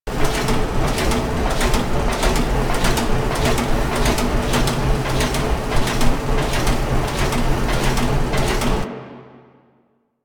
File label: Settlement Machine Loop